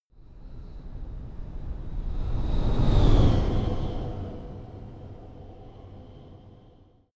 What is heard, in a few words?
sci-fi vehicle train passing long; raumschiff